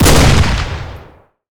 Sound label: fire; m14; arccw ud; Decay